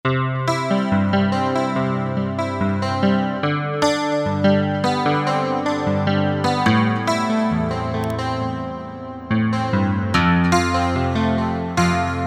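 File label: Stereo Strings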